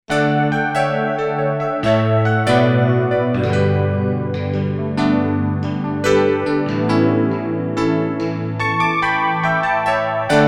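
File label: akai digital piano; s3000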